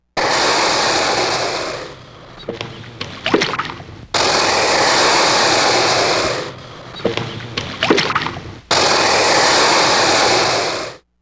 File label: gator; Compton's New Century Encyclopedia